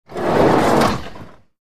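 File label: Dishwasher Rack Slide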